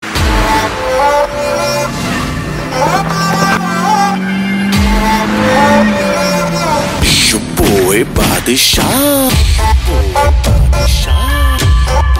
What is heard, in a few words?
Teaser Version Instrumental Ringtone